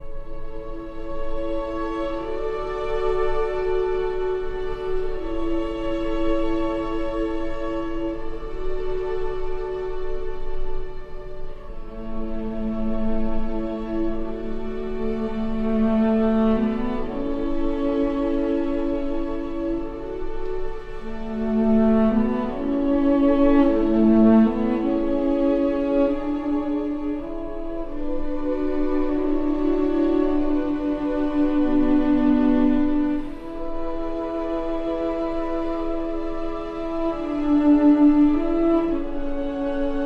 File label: for cello and strings; Archi di Santa Cecilia